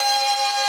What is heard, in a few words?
synth main